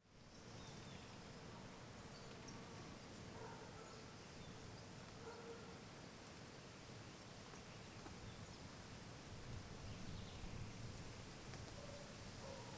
Ambiente fazenda dia vento com folhas passaros

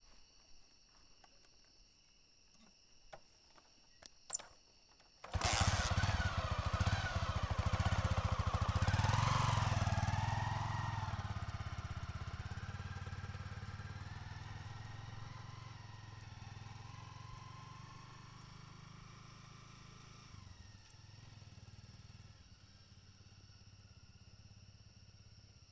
Moto dando partida, sai rapido e desacelera quando longe e grilos; maquinas; canais-stereo

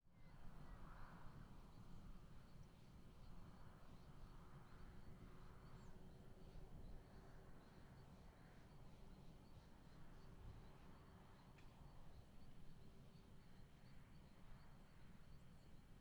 Plantacao de milho perto de estrada fim de tarde

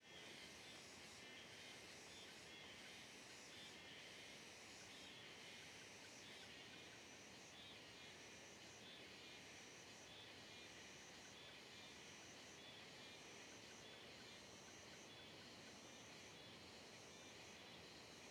Coro de Cigarras em Trilha com Rio ao Fundo; Cerrado; insetos; colecao sonora do cerrado

